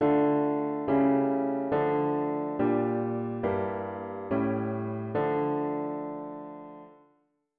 Harm Dict diat inv V7 inv bass

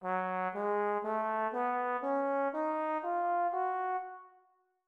mel minor F sharp 3

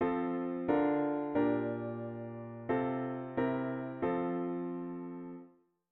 chord prog chunks diat inv and V7

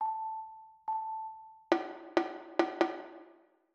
rhythm simple 1 beat

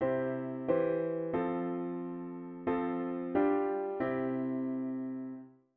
chord prog chunks diat inv and V7

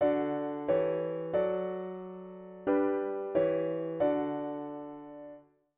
chord prog chunks diat inv and V7 inv

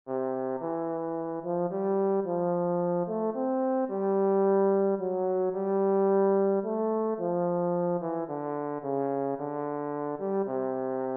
Mel dict chromatic long

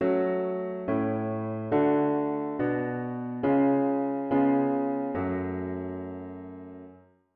root V7 bass

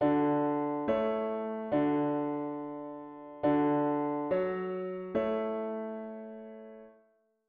diat root w bass

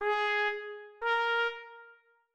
int up Ab Bb

